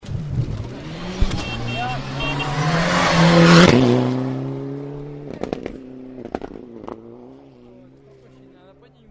buzina

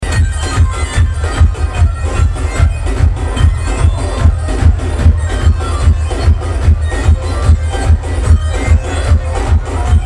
musica

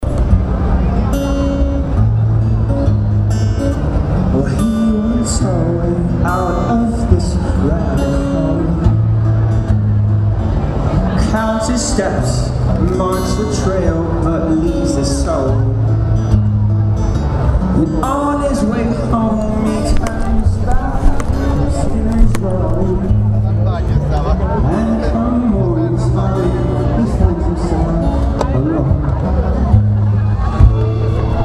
musica wires bass

